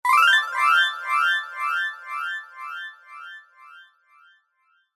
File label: multimedia game sound nature game ascending glissando